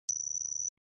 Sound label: phaser adjust